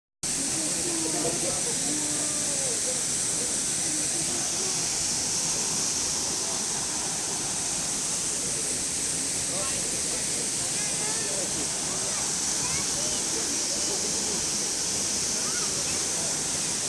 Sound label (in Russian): Водопад